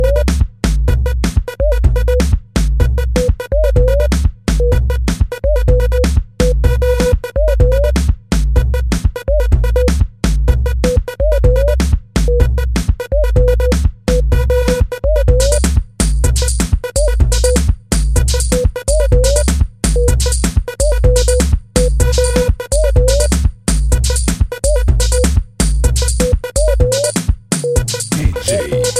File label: Dub House; Nokia